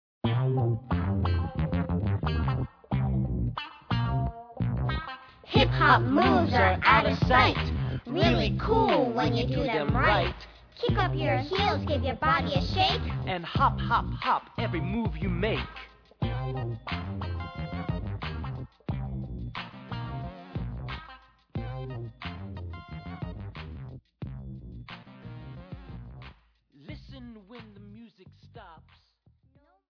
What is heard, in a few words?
Hip Hop Hold, Aerobics